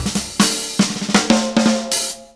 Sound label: Roll Jazzy, Drum Rolls; Zero-G Jungle Warfare 1, 2 & 3; 90 s Sample CDs